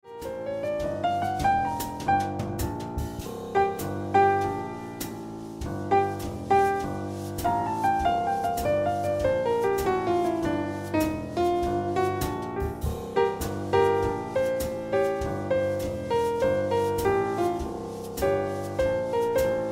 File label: Piano Music